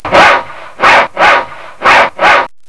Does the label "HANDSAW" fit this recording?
Yes